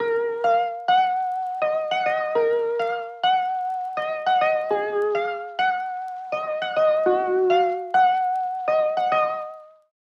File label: Melody Lead Reggaeton; midi wizard 2; Unison